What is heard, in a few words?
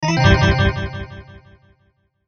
Logo Organ Delay Low; Sonic Logo; Blastwave